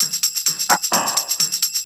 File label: BEAT; USB Soundscan vol.32; Roots Disco; AKAI